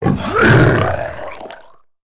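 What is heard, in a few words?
rakghoul attack; Star Wars Knights Of The Old Republic I; XBOX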